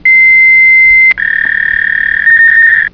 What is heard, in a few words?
Modem; Vesmir; Revelation Sight and Sound SK